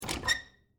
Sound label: Door Knob Turn, Foley, Door, Knob, Turn, Hard, Wood, Bathroom; Squeak; Miscellaneous Doors; BlastWave